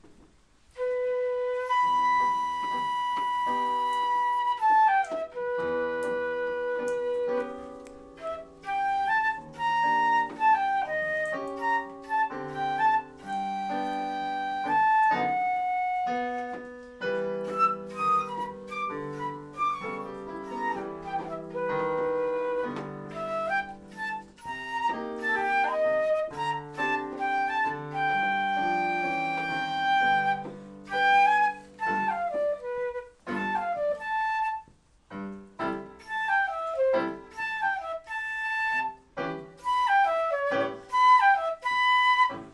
flute, Lonely in Blue

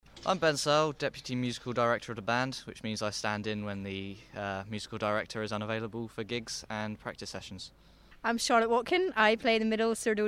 samba band; big noise samba band in barnsley

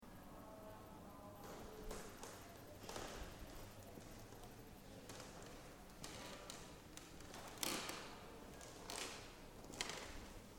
squeaky wooden floor museum; ludwig cologne germany

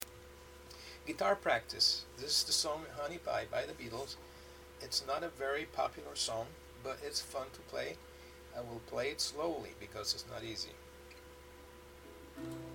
guitar practice, guitar beginner practice

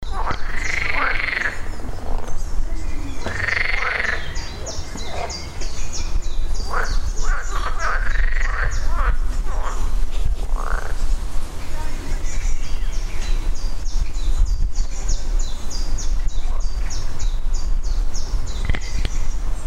frogs at the pond; audiomo day 2

